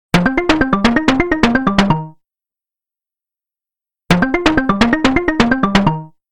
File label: Tribal; Sony Ericsson W380i